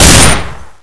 colt fire

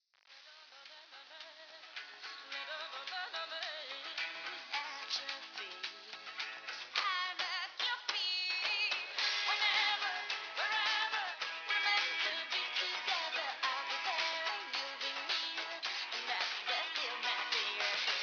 Last radio song loop

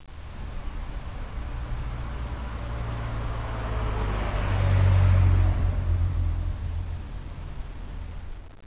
car by

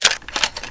assault holster

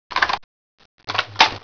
reload smg

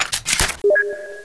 radio suit pickup

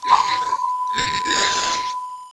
child alert

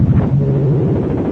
dog combat mode loop, synthcrab; scmod

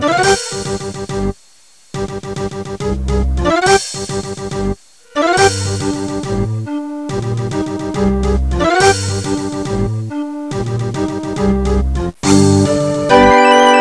clown gate theme

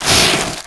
zombie vomit floor

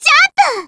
jump kick

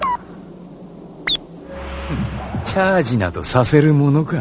charge

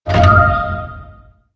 secret area

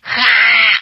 gaaa

